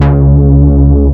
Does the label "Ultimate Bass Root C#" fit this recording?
Yes